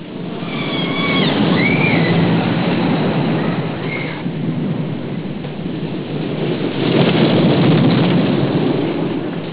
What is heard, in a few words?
rollercoaster